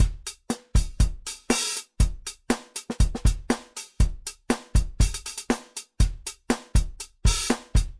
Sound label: Wave Drum SEP MIX; Decomp Mag Zero Phase TR